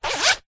foley bag leather small handbag or pouch front compartment pocket zipper; zapsplat